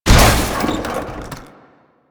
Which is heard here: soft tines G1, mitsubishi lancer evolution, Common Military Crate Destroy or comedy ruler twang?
Common Military Crate Destroy